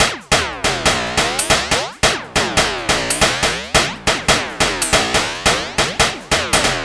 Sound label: DRM, LOOPS 140, House; Best Service ProSamples vol.10 - House; AKAI